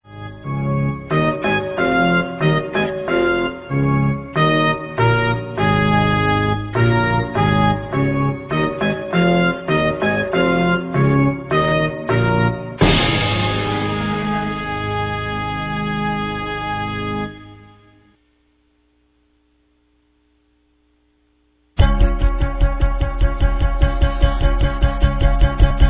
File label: drum solo